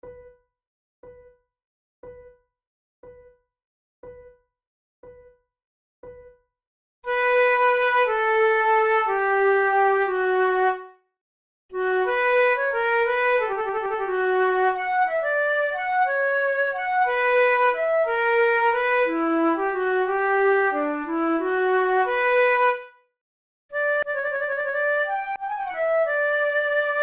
for two flutes sicilienne; Monteclair; 6 concerts; flute tunes

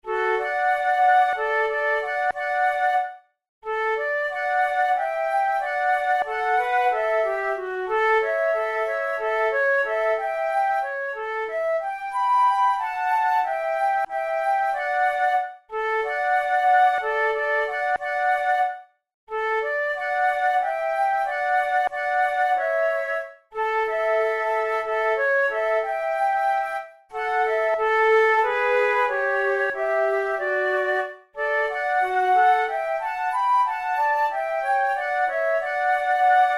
duet

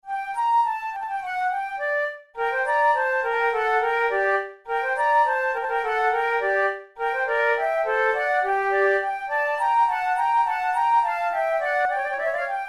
allemande, flute

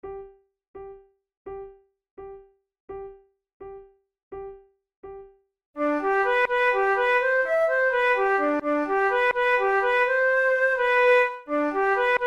duet; Over the water to Charlie

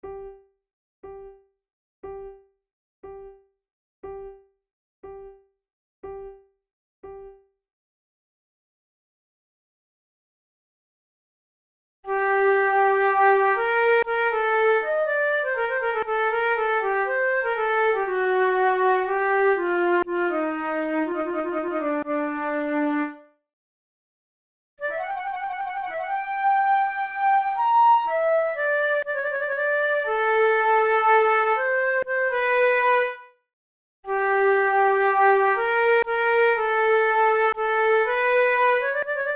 concerts for two flutes prelude; monteclair

